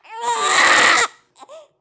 Single Baby Hard Cry

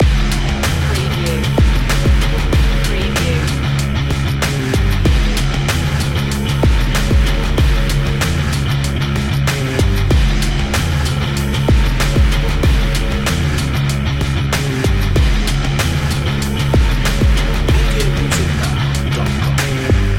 bang the bass loop

